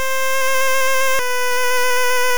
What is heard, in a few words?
Perish Song; attack moves; pokemon; gen 2; gsc